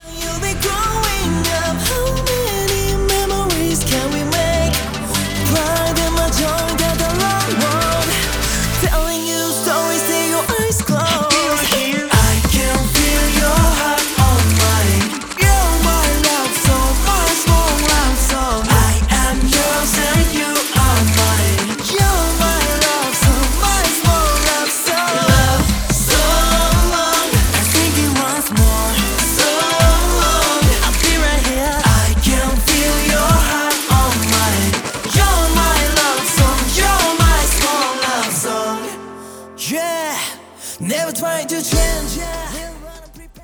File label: Small Love Song